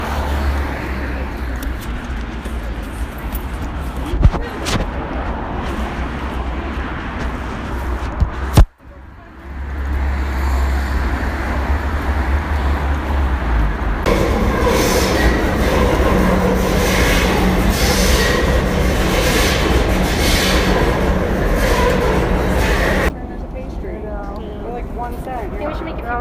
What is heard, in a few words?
soundscape